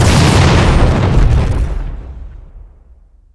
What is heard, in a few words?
explode; aw50